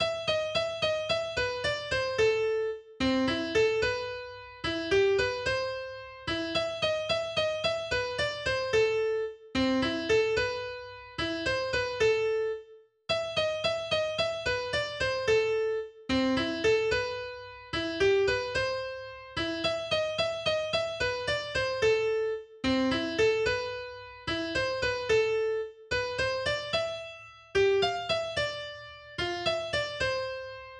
Für Elise Solo AkGit; Beethoven

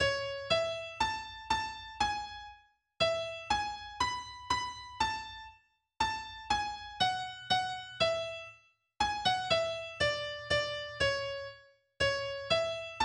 Barcarole Solo AkGit, Melodie-Solos; Coste

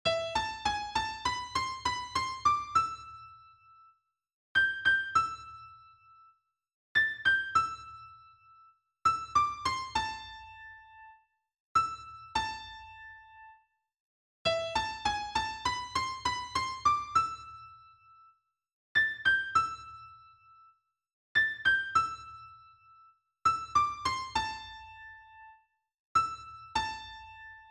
El Condor Pasa Traditional Solo EGit

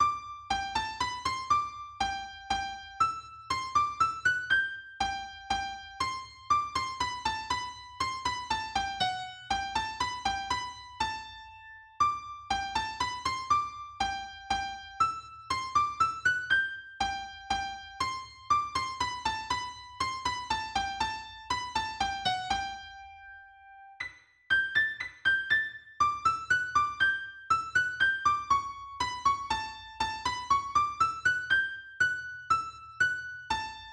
Minuet in G Solo EGit; Petzold

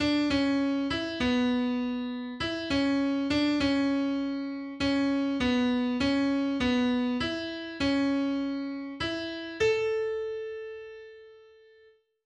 Vorübung, der Ton c# bzw. db auf der A-Saite, Gitarre; c#/db4; Gitarrenkurs zum Erlernen des Notenspiels auf den untersten vier Bünden

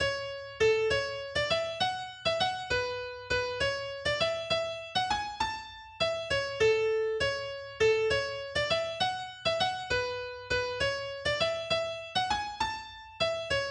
Solo AkGit; Melodie-Solos

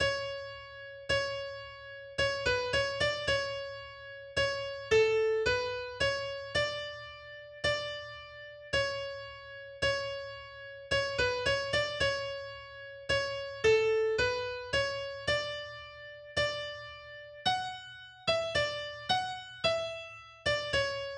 Der Fuggerin Tanz Solo AkGit; Neusiedler

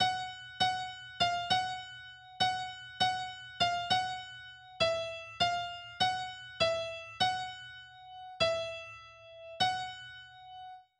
Vorübung, der Ton f# bzw. gb auf der hohen E-Saite, Gitarre; f#/gb5; Gitarrenkurs zum Erlernen des Notenspiels auf den untersten vier Bünden

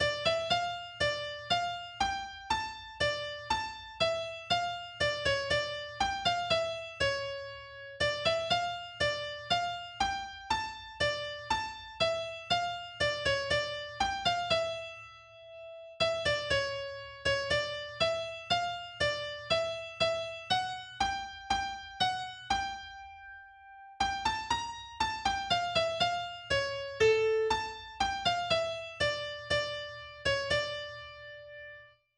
Bourree in D Moll Solo AkGit; Melodie-Solos; Visee

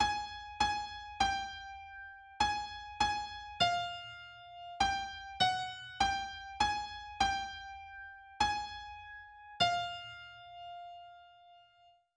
Vorübung, der Ton g# bzw. ab auf der hohen E-Saite, Gitarre; g#/ab5; Gitarrenkurs zum Erlernen des Notenspiels auf den untersten vier Bünden

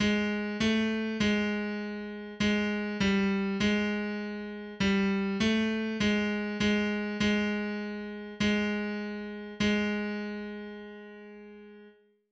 Vorübung, der Ton g# bzw. ab auf der tiefen E-Saite, Gitarre; g#/ab3; Gitarrenkurs zum Erlernen des Notenspiels auf den untersten vier Bünden

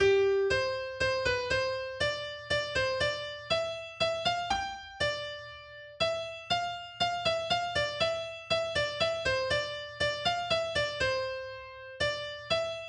Gassenhauer Solo AkGit; Rathgeber

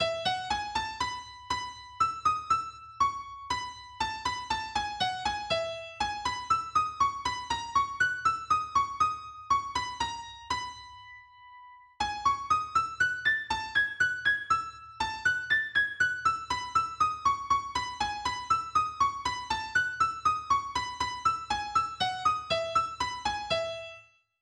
Menuett in E Solo EGit; Falckenhagen

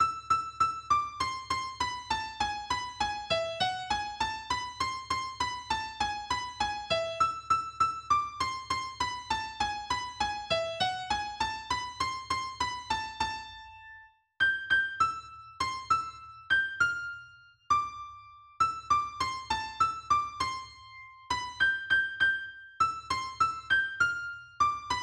Tarantella Solo EGit; Giuliani

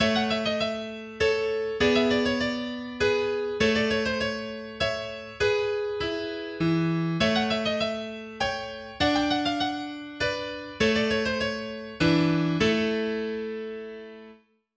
Adagio in A-Dur, mehrstimmige Gitarrenstücke; Tarrega

